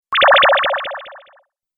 Laser Pulse With Delay, Laser Sound Sound Effects

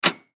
Cash Register Antique Button Push; Cash Registers; Industry

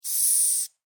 Insect Hissing Cockroach Single; Animals:Insects; SFX Bible 6

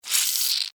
Insect Hiss Angry, Insect, Hiss, Angry, Bug

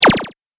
8 Bit Style Zapper; Video Game Sound Effects

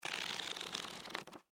Office Book Paperback Page Turn Long; Offices

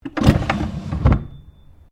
Drawer Cabinet Wooden Large Heavy Close

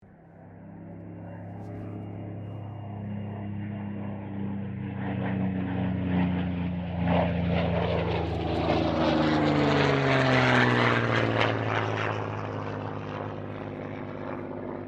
AIRCRAFT SUPERMARINE SPITFIRE MK-XVI PASS

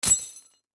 Industry Chain Set Down Tow Truck Cable; Factories; Backgrounds

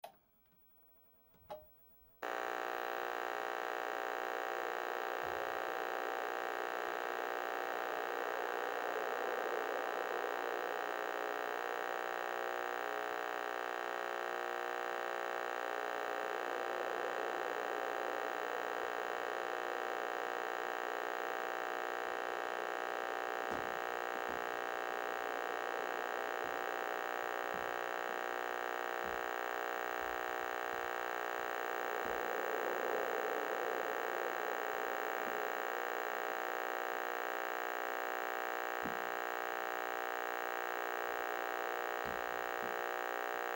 Television Broken On Run Off Buzz; Televisions

